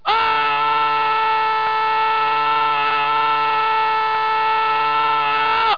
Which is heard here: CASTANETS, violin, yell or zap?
yell